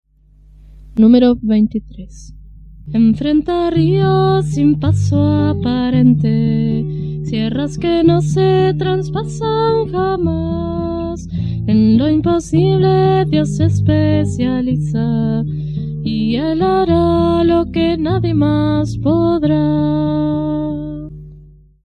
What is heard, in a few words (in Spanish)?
Coro, himnario niños